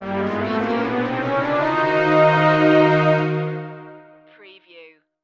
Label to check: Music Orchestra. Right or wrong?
Right